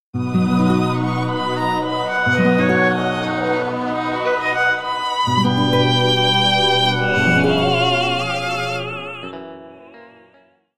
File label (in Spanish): Cajones